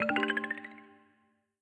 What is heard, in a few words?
spin Button Click; netent; luckyangler